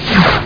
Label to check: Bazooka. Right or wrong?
Right